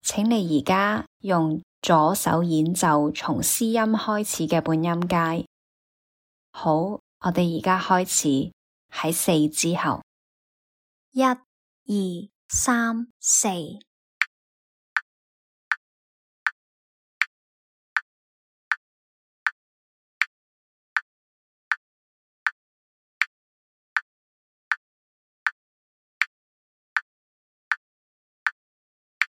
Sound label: chrom scale c lh